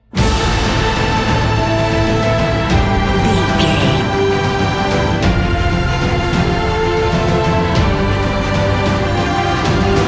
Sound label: battlefield epic cinematic